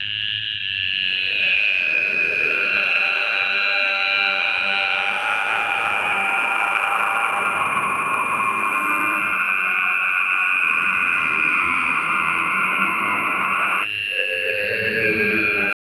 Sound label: a large beast wendigo mak